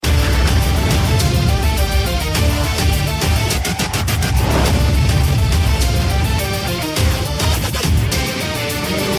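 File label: background music Ringtone; Dhoom3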